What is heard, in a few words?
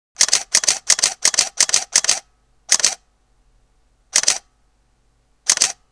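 Sony Alpha DSLR-A100 continuous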